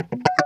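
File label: Guitar Muted Scratch; Digital Sound Factory Xtreme Lead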